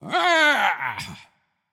battlecry; smite; shiva

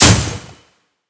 ability hit; smite; god arthur

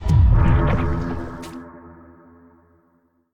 jump; Smite; Vulcan

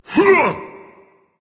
grunt attack berserk; cuchulainn

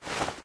footstep cloth; guanyu; smite; hades

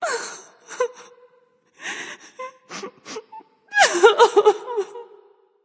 boo hoo; smite; voicepack; jingwei

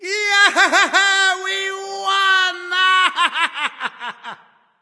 yay; chiron

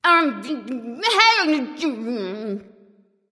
grumble; freya

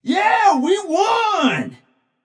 nv yay; smite; xbalanque

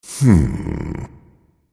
thinking, voice; Smite; ymir skin9

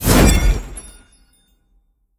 projectile; smite; olorun

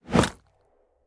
swing; smite; god odin

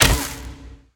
bow fire; smite; god artemis

